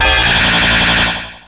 shocker, electronic sounds

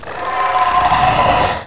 Roar; Dragonzord; Rangers Screensaver

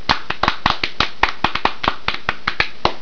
clap

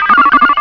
Damage; CrissCross'em

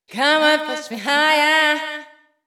VOICE GRL HOUSE 125 A, House Voice

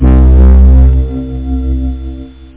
SOLO floot; JumpingAround

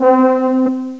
Church Organ; Castles - Siege and Conquest; Castles Demo

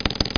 blip

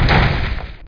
crash; robotron

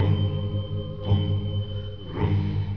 Country Holiday Program Error

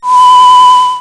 Glass Harmonica; Twenty-five Beeps #2

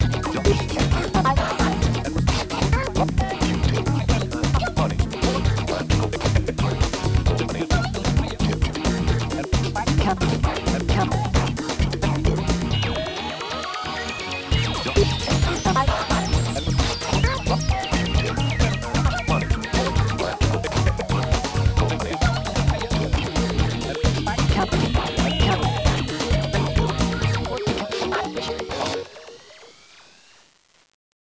Digital Rave Theme; MMStarterCD

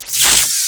shield impact

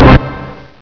chord

